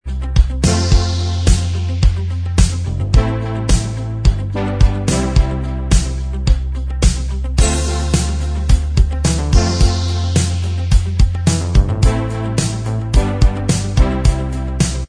Inst Track

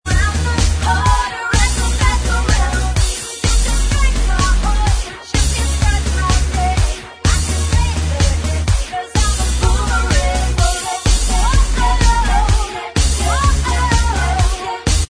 Bvox Track

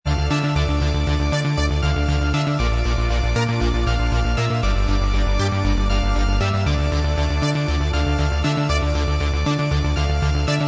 Inst

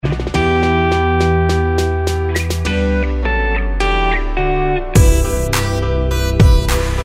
Inst

